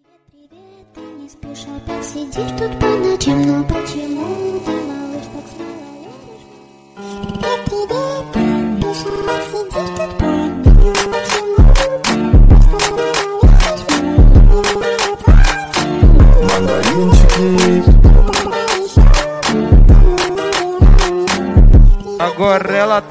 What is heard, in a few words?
Funk Remix